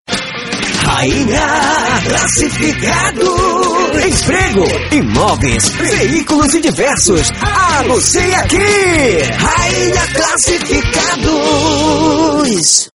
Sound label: Jingle; Jingles radio